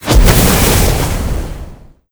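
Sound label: gate spawn, monster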